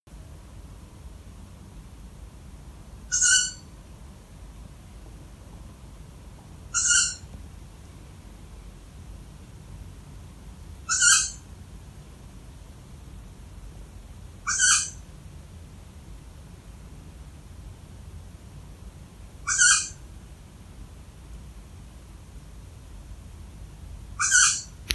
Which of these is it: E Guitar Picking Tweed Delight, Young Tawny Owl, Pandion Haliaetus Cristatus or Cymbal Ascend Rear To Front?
Young Tawny Owl